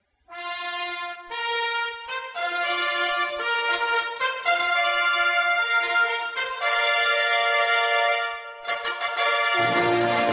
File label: Munich; 1972